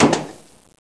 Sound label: gunshot